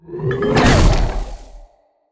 enemy soulcaller death

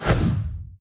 basic Attack; tower necromancer

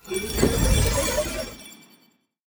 hero cleansing; sfx

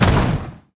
warlock ranged impact

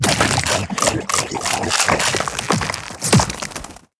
enemy acid basic evolution

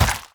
enemy void Blinker death

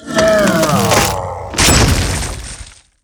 death con explo

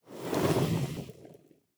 rain Of Fire loop Start; tenshi

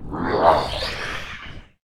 canibal zombie

